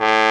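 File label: Trombone, Orchestra Brass, Roland; Club-50 - Foundations Roland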